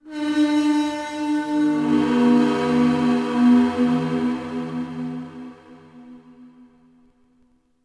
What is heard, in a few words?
ice; DAoC